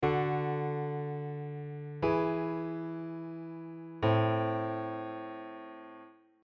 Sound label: root notes